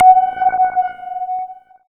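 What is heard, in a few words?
synth power, MicroWave; Sound & Vision - Gigapack I CD 2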